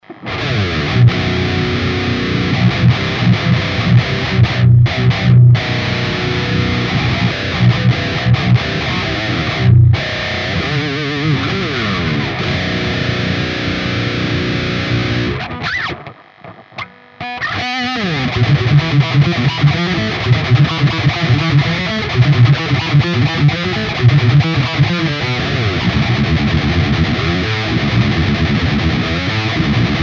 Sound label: fireball pre; POD HD 500